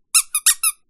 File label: squeak